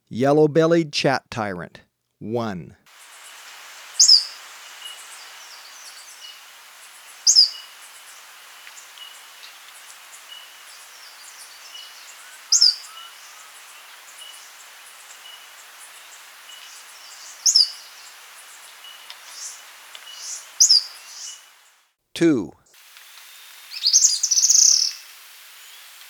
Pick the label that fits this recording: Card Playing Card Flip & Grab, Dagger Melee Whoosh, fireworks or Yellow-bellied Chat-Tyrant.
Yellow-bellied Chat-Tyrant